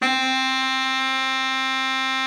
BARITON, BARITON SAX

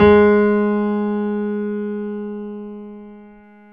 BOSENDORF

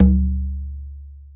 Tumba Ultra Low; Sonic Foundry (Sony Creative Software); Joe Vitale Latin Percussion

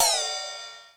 Ride, Korg DSS-1, Drum Machines

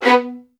B3, LOUD VIOLINS; Q Up Arts; Denny Jaeger Master Violin Library

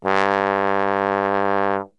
G1 BASS TRB, Brass; Best Service; Brass Super Section

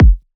kick warm

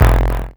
Metals Kick

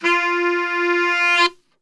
F 2 SAX, Brass Super Section; Best Service

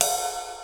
TR, drums perc, Roland

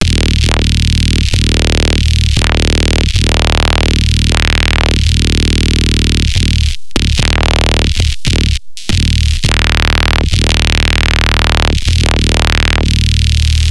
double ott bass; copycatt

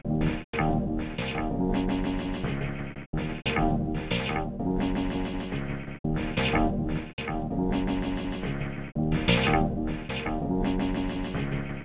country bass; Polyend; Loops n trouble

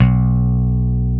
BAS; Best Service Gigapack 2

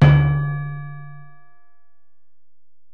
Bell, Alesis DM-PRO

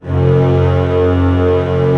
STR C0 FF, Orchestra; Sound On Sound - Peter Siedleczek's Orchestra

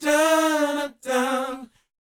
BOYZ, Vocal Planet, R&B Dance; Spectrasonics Vocal Planet

